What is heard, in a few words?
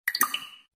Liquid, notifications; Coolpad; ESurfing-No1-2021